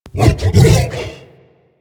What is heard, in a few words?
Juggernaut Truffle Found Initial, Infested; Warframe; Truffle Hunt